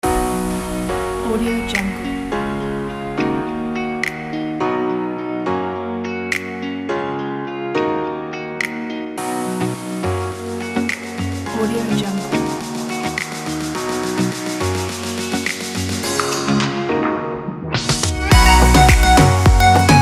summer party dance pop

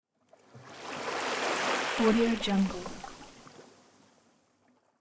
sea shore wave

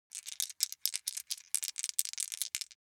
foley dice small three rattle in hand; smartsoundfx

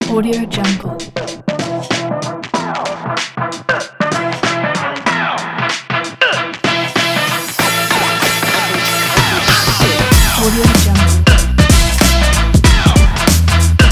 the hiphop

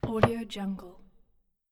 wooden storage bench close

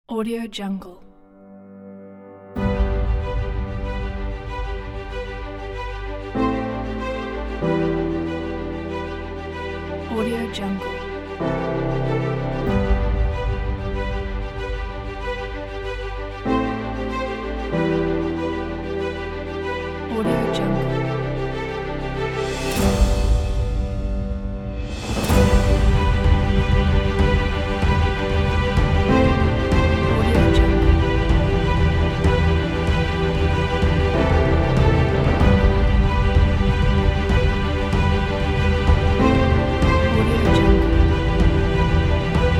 inspirational cinematic

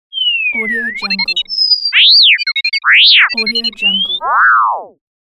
droid robots

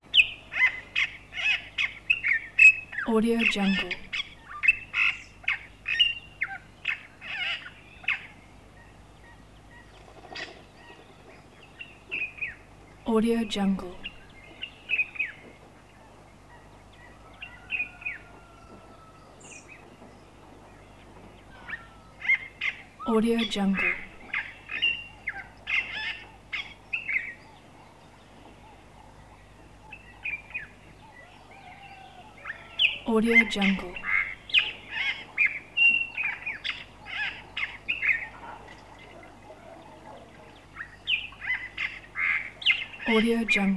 birds medium close; prosoundfx

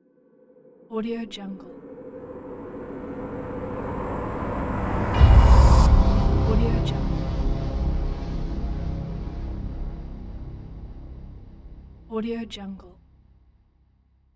rising foreboding horror stab

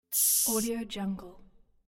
cartoon silence tsh

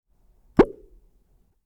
boink wet suction pop blip hollow

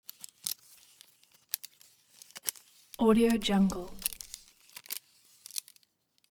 object tool meter fold in sequence

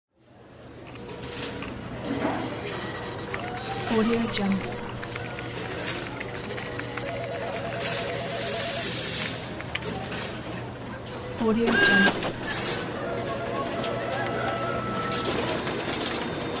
office

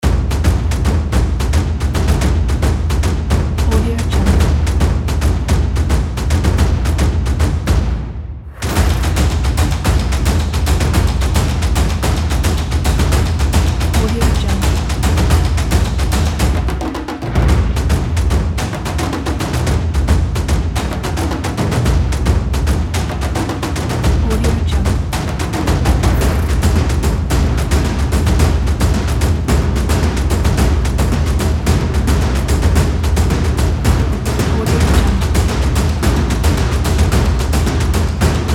epic drums

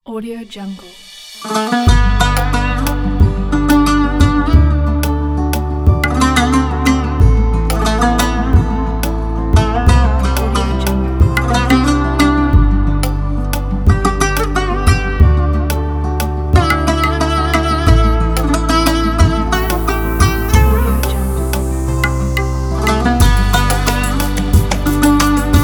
oriental central asian

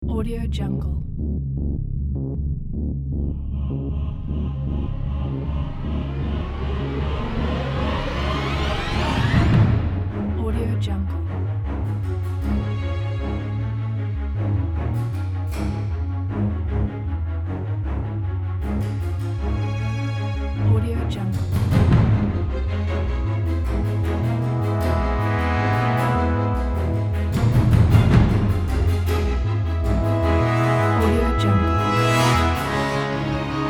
espionage rising tension

